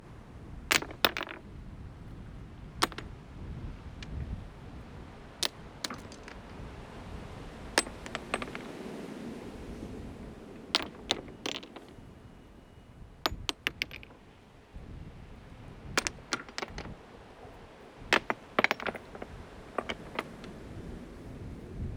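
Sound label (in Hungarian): koreket dobni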